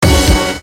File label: bonus chance; Royal Unicorn